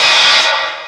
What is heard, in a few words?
VERB HH, Drums; Distored Reality 1&2